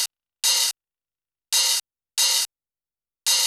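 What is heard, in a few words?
GROOVE open, Techno 138 BPM; Best Service ProSamples vol.54; AKAI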